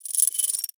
Collar Jingle, Footsteps; Pets; Foley; Warframe; Zanuka; Zanuka Companion A

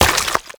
Tenno Run Sludge, Footsteps; Warframe

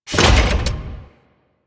Powerplant Jettison Door Start; Ambience; Warframe; Corpus Railjack; Tether Power Plant

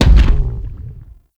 stomach grenade explosion; Warframe; Grendel

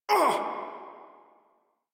Pain Modular Corpus Male; Dialog; Barks; Battle; Warframe

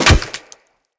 Melee Staff Impact Flesh

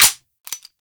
Revolver Pistol Mech

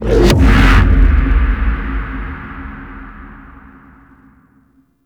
Railjack Blackhole Ability Appear; Warframe

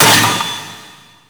Vaults Stake Gate; Gameplay; Warframe; Entrati

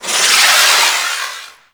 Glaive Projectile; Warframe; Ninja

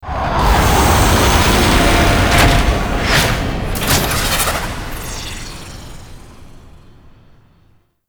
Trench Run Intro Detach; Archwing; Liset; Grineer; Cinematics

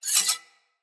Sword Slice Accent; Weapons; Player; Garren Sword

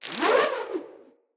Rope Winch Rope Retract

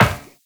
Run Metal Perforated, footsteps; Warframe; Tenno

